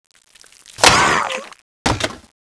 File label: zombie gun reload; zmall